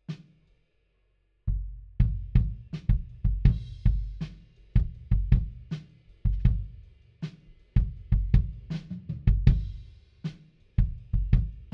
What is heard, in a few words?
Kick In, 160 BPM